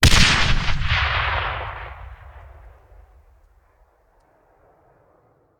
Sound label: Grenade Hand; Sound Ideas; Guns